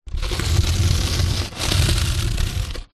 polystyrene expanded block scrape drag pebbled concrete ground; foley